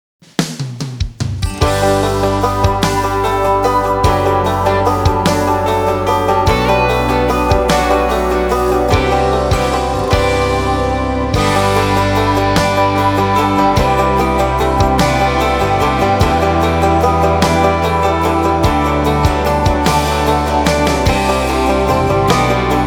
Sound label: INSTRUMENTAL